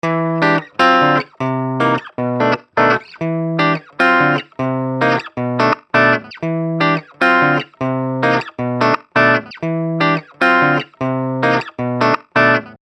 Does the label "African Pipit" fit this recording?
No